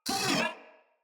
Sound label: Moa Vocal Pain